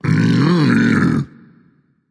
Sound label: tongue, voice; Smite; ymir skin14